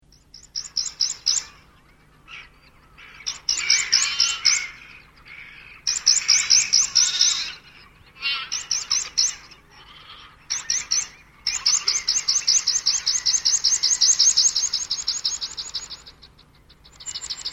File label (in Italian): pispola; faunistico